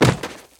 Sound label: Crushing Infantry; 1944 Battle Of The Bulge